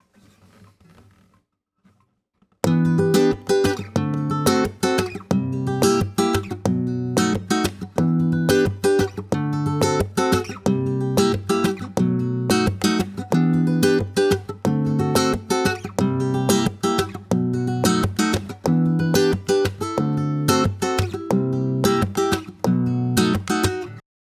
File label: Flamenco Session Two Guitar Dry 90bpm G